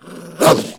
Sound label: Wolf Attack; Creatures